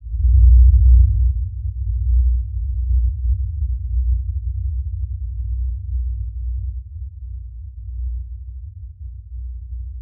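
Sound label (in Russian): звуки концовок outro исчезание затухание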